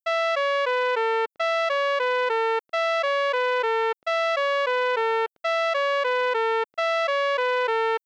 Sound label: Canadian Melody